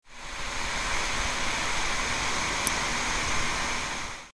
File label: pc fan noise; xpc